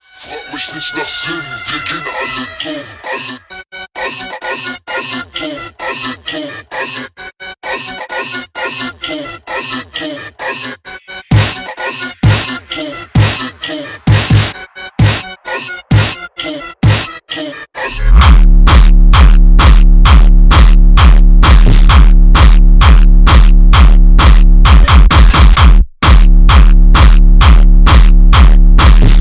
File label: super slowed bassboosted